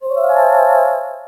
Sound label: Coin; Ghouls n Ghosts